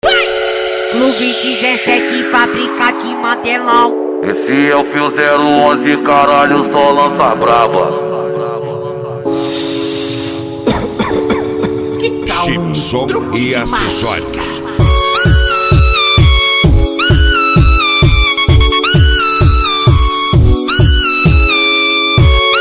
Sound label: especial funk; som e acessorios